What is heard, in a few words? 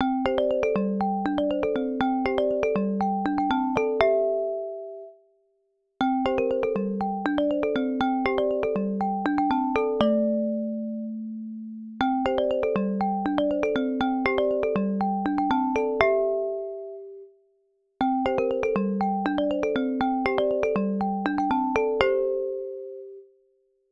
marimba